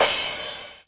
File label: CRASH 909, TR909; GM KIT